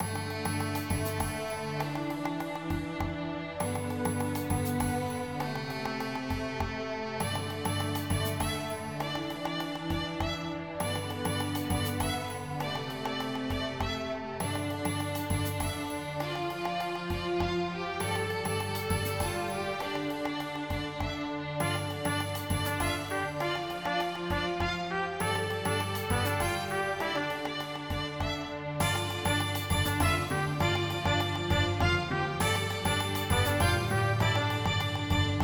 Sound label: battle theme